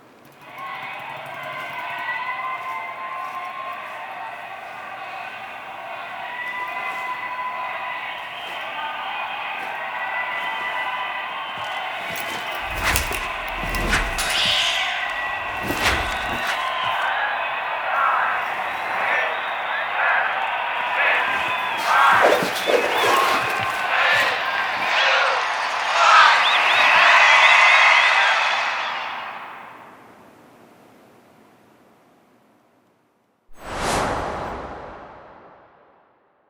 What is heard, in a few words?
Kiss; Amir; Warframe; Nineteen Ninety Nine; Quests; Romance NYE